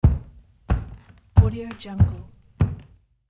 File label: barefoot footsteps on wooden floor